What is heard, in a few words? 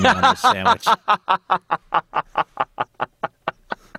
awesome laugh